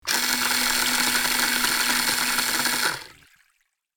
Toy boat in water remote controlled; rc toys sound effects

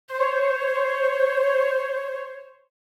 Cartoon flute trill

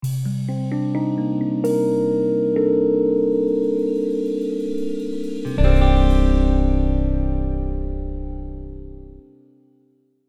Nostalgic theme sentimental sitcom music

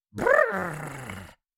Cartoon little monster voice brrrr

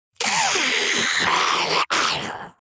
Demon beast imp monster attack shout

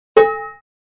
Metal hit impact bash thud

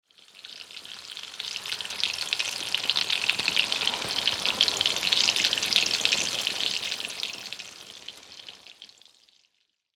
Pan frying slow cooking; household

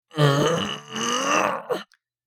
Male vocalization grunt single short

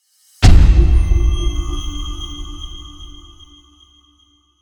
Cinematic trailer hit

